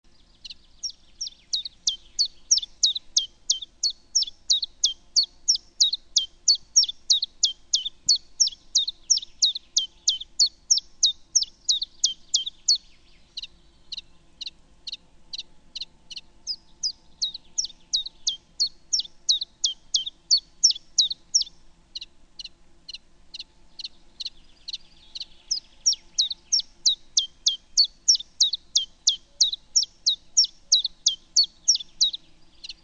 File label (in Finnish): tiltaltti; Laulu pehmeästi tiputteleva ”tilt-tilt-talt…”, kutsuääni ponnekas ”hyit”; kuusivaltaisissa metsissä